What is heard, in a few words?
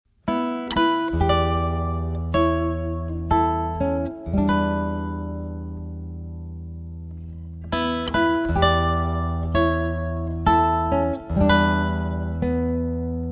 Nylon